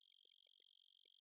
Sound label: game tone toggle scroll